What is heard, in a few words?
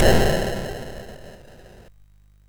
sid MOS6581R2